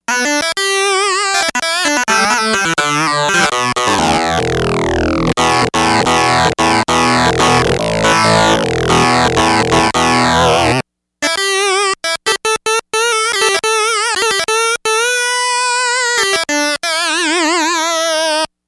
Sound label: Heavy synth, Yamaha DX100; Factory Patches